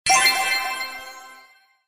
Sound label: Sfx Item Mythical